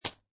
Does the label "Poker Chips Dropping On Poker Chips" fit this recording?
Yes